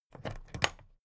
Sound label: plastic clasp open heavy